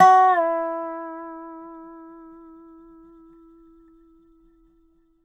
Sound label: dobro; DOBRO DOWN; Country